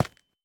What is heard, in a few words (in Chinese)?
方解石踩踏声